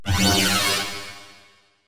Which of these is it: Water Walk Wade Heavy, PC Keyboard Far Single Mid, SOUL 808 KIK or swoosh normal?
swoosh normal